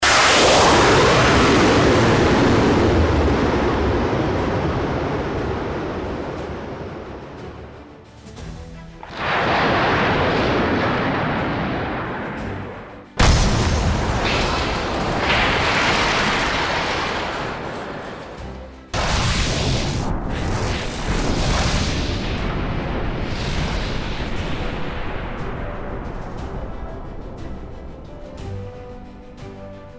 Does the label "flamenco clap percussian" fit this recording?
No